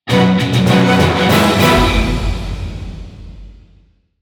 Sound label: Mini Won